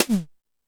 Toms 1, drum machine; E-MU Pro-Cussion-1

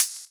Open Hhat, HiHat; Equipped Music; Loungin House

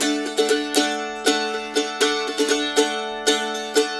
Blues Guitar Samples, RIFF

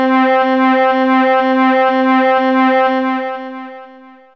Poly C5, Fairlight CMI IIe Hi String; 12HiString

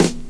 SD, dance drums hip

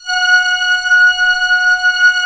ORCH C5 PP, Orchestra; Sound On Sound; Peter Siedleczek's Orchestra

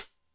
Cym 14' Thin, Drum Machines Alesis D4

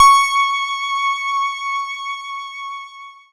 C#, Synclav FM PLUCKED; Big Fish Audio

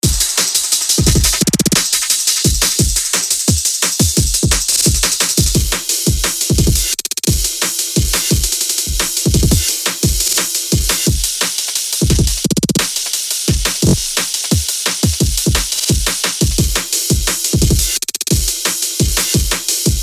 big ol breakbeet

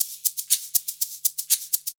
120 SHAKER; Best Service Advanced Media Trax Modern Composer

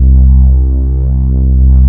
JP ANA PADS; Pro-Rec - Synthsonic; JV ANALOG1

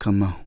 perc voice, Roland MC-909

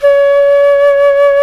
jazz flute

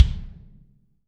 BD, Real Mega Drums

